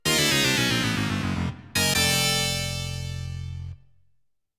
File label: Game Over; Legend of Game Music Premium Box; Midnight Landing